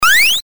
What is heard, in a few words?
jump